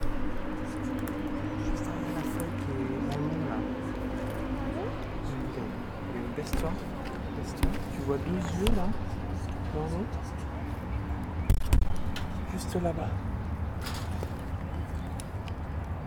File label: Directional Sound